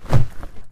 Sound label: z swipe, Zombies; zps; Attack